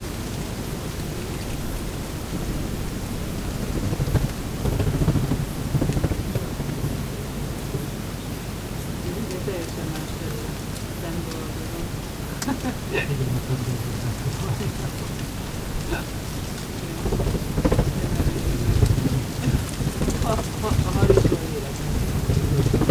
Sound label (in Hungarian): barlang intenzív beszéd, denevérek; repules; termeszetben